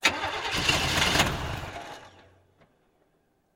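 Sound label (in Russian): zvuki startera avtomobilia, car starter sounds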